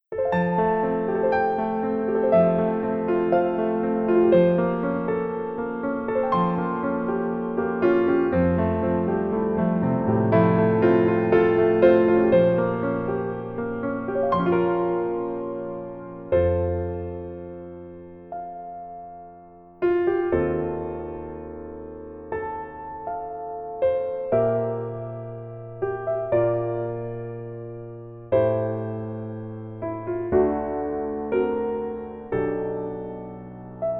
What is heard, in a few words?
Piano ver